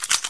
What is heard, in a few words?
ammo pickup; weapons; source engine; dod